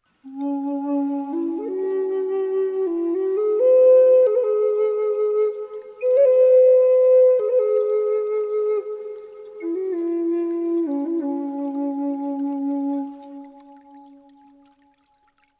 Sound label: Bamboo, Flute